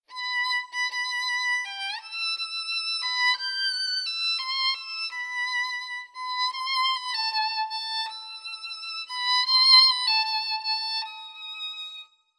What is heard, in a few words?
performer live